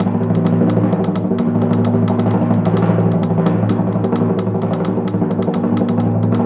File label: Drumroll Loop; Burnout CRASH!; PS3; Xbox 360